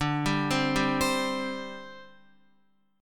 D 7th Suspended 4th, down, Guitar; arpeggiated; JGuitar

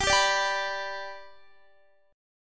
G Major, Guitar; strummed; x,22,19,19,19,19; 4F,4A,5C,5G,5C,6E

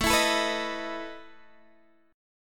E Major 7th Suspended 4th, A

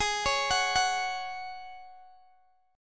Ab 7th Suspended 4th Ab down Guitar Open G

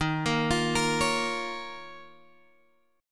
Eb 7th Suspended 2nd Eb, Guitar Open G; arpeggiated; chord

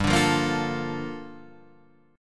Bb Major 7th, G; Bbmaj7/G; strummed; JGuitar

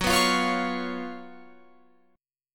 F sharp Major 7th Suspended 4th, Guitar, Open F; F#M7sus4 strummed; 18,18,17,20,20,20

